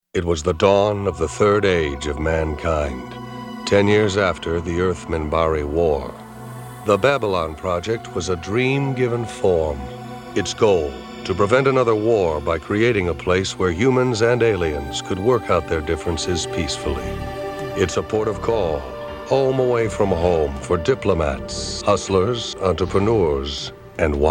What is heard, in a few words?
Main Titles with Narration